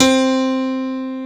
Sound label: PIANO; CMP - Analogon